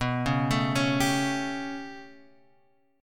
B 7th Suspended 2nd Sharp 5th down Guitar Open G, chord